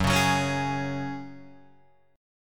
Gb Suspended 2nd, Guitar Open D

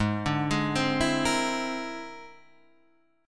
Bb 9th Flat 5th Ab, chord

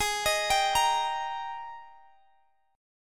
Ab Major 7th Suspended 2nd, chord

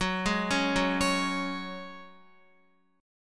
Gb Suspended 2nd down Guitar, chord; Gbsus2 arpeggiated

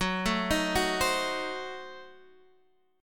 D sharp Diminished 7th F sharp

